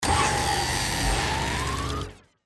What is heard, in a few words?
Burst Mechanical